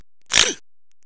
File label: snort